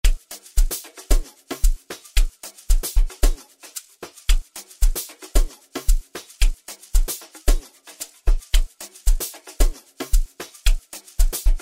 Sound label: amapiano